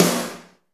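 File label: snare drum, Real Mega Drums; Best Service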